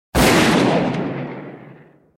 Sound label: gun